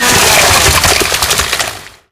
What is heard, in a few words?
chainsaw gore; npc; melee; infected